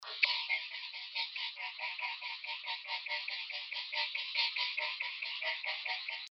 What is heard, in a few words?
electric gamelan METAL HIT LOW